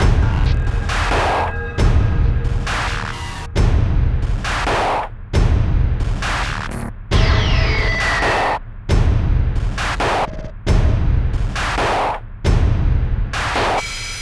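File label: heart beat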